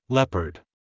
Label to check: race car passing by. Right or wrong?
Wrong